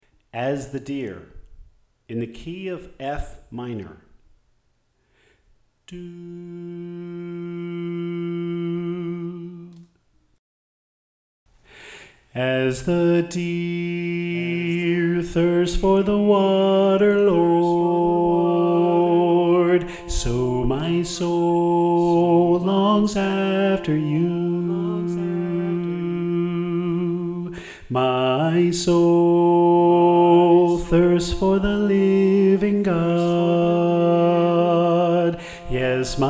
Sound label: Tenor